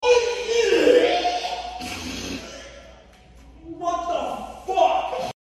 epic vomit

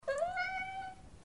miau

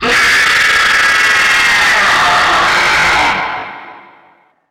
jumpscare sound; fnaf evolution

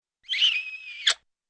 squeaky kiss

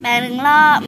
ba dung loo

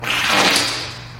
moist fart

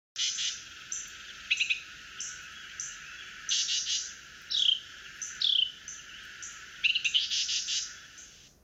carolina wren call

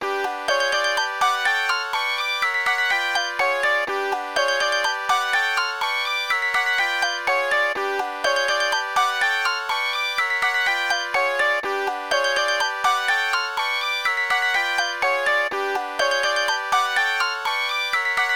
alarm

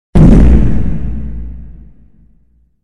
reverb fart extreme bass boost

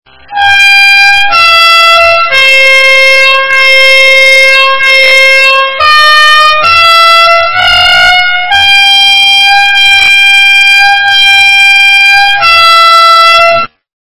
car horn slowed

